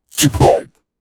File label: fighter steps, fighting